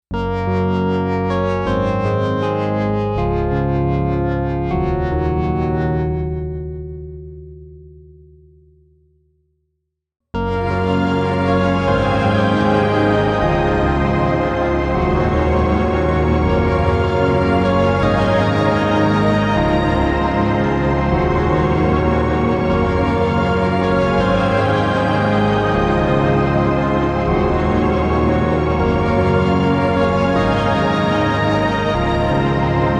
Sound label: shimmer yamaha dx21; BigSky